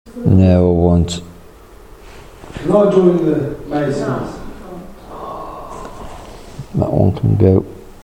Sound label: arrrg cellar